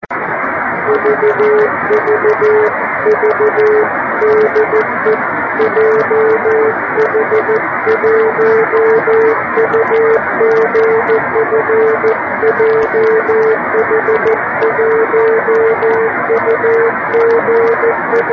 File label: beacon; 5G; Kawasaki; 横浜市; 茅ヶ崎市; 曇、気温18℃ 湿度70%; SSB:RST519; 45cm offset dish; ANT方向: 川崎; 伝播距離：34km